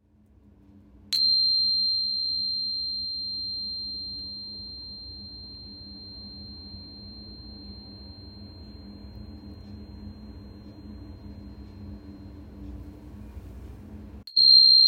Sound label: angel tuner